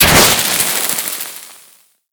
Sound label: Heat Gun Hit Effect